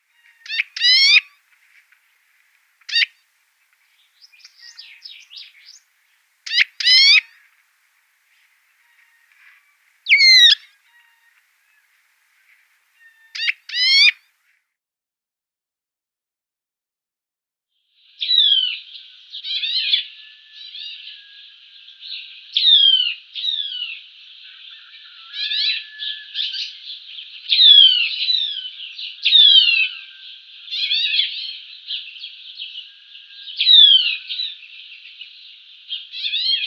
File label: House Bunting calls